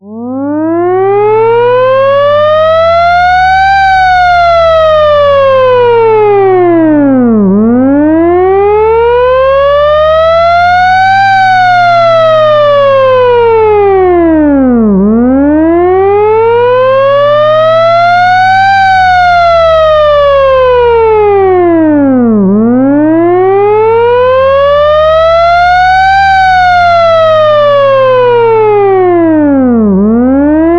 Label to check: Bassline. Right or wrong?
Wrong